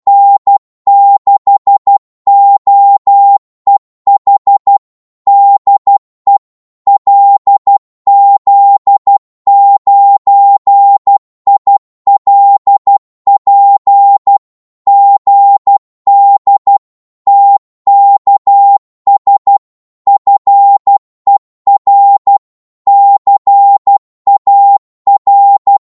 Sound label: qso 12wpm, Morse